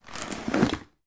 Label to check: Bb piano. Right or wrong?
Wrong